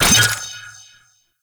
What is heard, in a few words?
Shrine Assemble Frag Collected; Warframe; Duviri